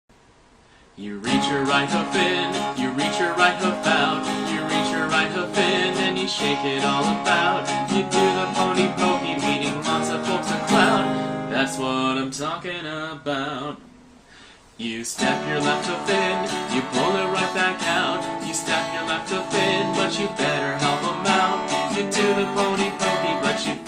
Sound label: Guitar Cover